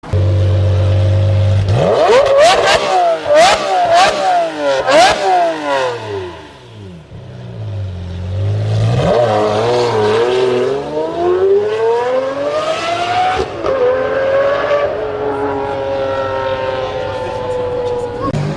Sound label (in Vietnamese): tieng po sieu xe